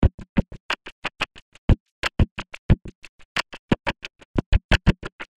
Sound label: FM beat audio example